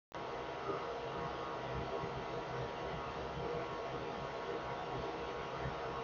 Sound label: full muffle high, 3dprinter fan noise